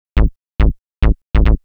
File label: SNTH BASS TEKNO 140 A, Techno, Bass